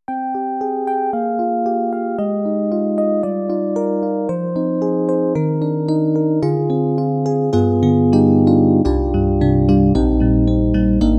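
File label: SD-90 Contemporary FM Electric Piano; EDIROL; FM E.Piano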